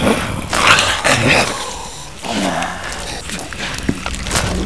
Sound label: zombie eat